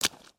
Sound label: Foot Swamp Water Run, footsteps